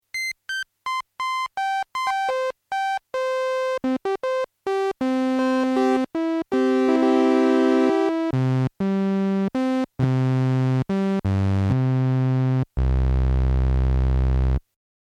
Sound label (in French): FM DX7 analo dent de scie; signal dent-de-scie analogique; sawtooth; Démo audio des fiches de sons FM détaillées dans le livre